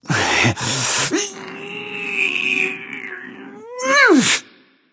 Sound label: grunt lift; smite; apollo